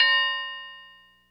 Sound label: kwang, Bells